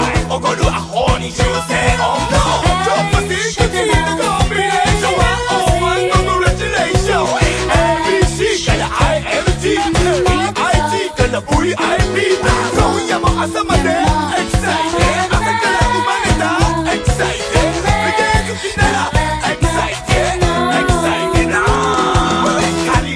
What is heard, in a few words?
japanese reggae